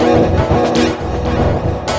TABLAS; Zero-G; Chemical Beats

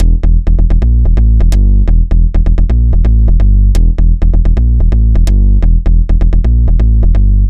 Jump Bass; Dr. Fink's Funk Factory

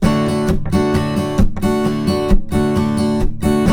Blues Guitar Samples, RIFF; ILIO; Fretworks

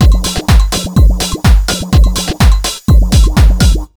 125BEAT, Disco House; USB Soundscan vol.20; Fresh Disco House I; AKAI

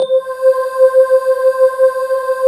future pad C5; Greytsounds; Scott Peers Sound Engineering

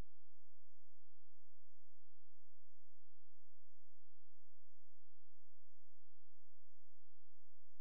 A#, Synclav FM; Big Fish Audio; SPACE1

